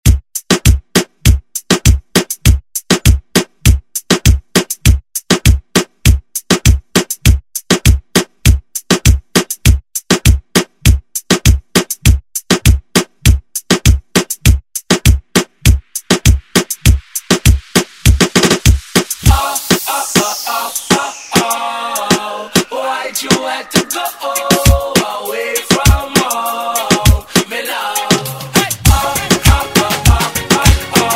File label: Reggaeton